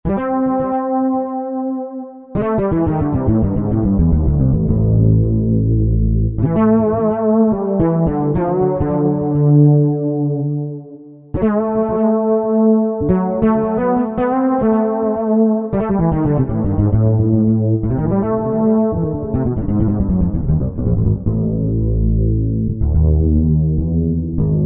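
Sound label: Wet Fretless, Roland JV-2080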